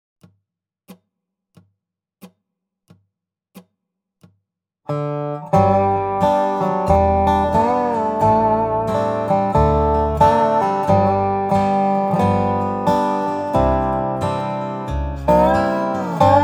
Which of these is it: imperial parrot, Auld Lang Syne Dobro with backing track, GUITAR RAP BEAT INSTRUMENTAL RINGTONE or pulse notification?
Auld Lang Syne Dobro with backing track